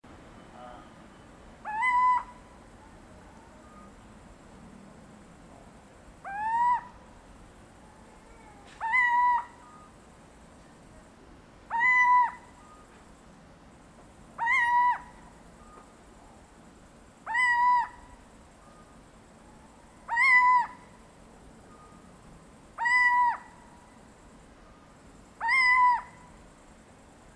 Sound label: Athene noctua, Steenuil; uilen; Strigidae